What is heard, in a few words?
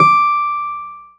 BD D5; Keyboards; Ableton Foundation FreePacks; Model-C